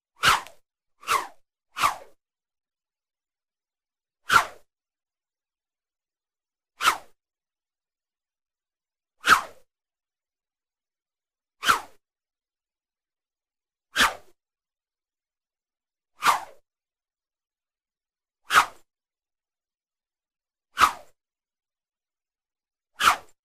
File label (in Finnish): viikate